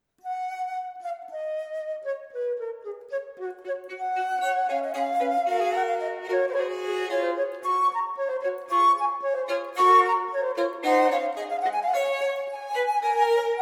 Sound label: Sonata 1 in G major - Presto, Flute and Violin; Joseph Bodin de Boismortier; Six Sonatas for Flute and Violin - Opus 51